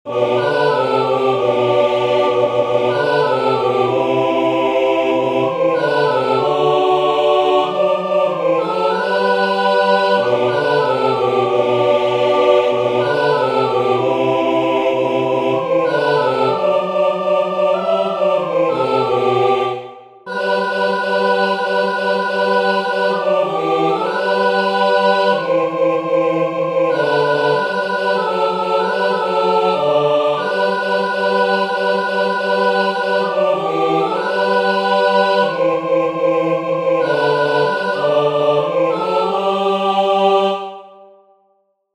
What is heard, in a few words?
Jingle Bells